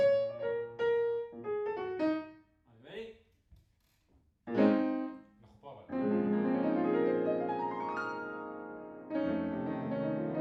Piano Room

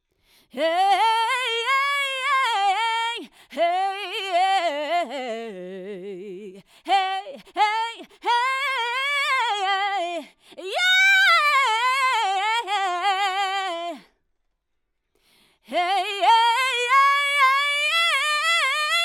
Soul

